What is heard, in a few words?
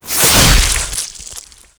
hit flesh stab adult